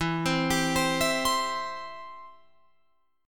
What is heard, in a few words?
E Minor Sharp 5th, Guitar, Open F; Em#5 arpeggiated; JGuitar